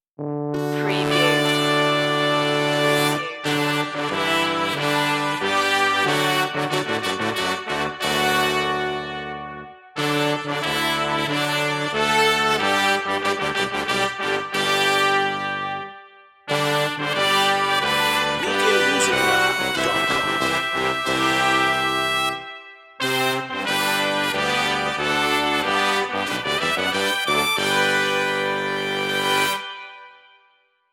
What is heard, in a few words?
happy birthday to you brass